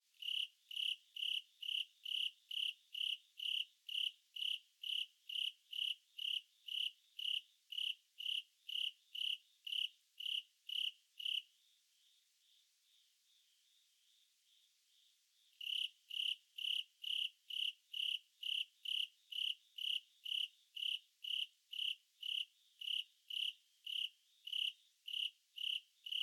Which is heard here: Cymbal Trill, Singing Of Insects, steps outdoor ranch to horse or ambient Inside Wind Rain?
Singing Of Insects